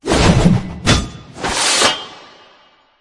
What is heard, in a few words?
Soldier Sword